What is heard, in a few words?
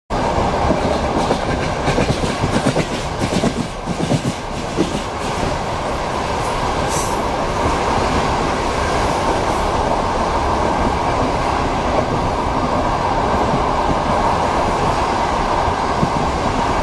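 train sound, clatter of wheels; Zvuk poezda; stuk koles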